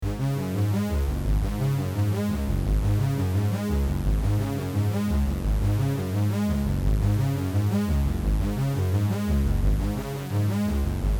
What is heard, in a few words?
fat arp 86